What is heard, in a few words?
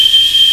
WWIND, WEATHER